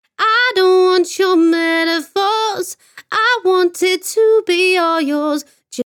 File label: Female Vocal